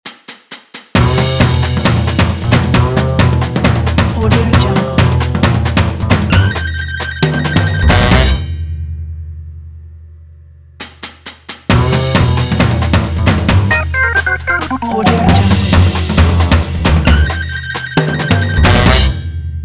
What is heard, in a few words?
upbeat jungle drums logo